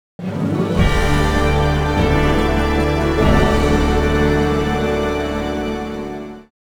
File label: Boss Battle Ends; ultimate ghosts n goblins playstation portable psp